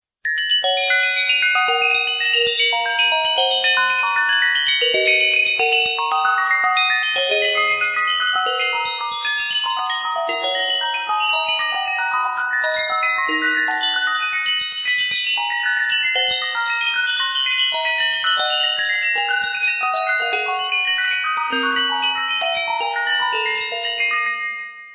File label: Halloween Bells